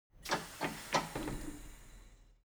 construction garage tire changing machine hydraulic separator; unsteady